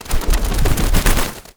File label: Bird Three Wing Flap; Ambience; Warframe; Entrati